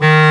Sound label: WND HARD, Clarinets, Clar Bryt; Roland LCDP04 Orchestral Winds; 90 s Sample CDs